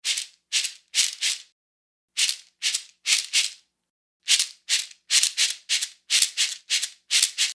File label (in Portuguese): AGÊ